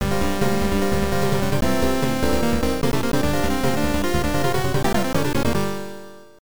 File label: Race Start; F-1 Dream; TurboGrafx-16